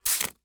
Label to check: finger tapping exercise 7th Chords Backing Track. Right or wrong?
Wrong